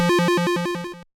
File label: Arp, Stab & Hit; Bitkits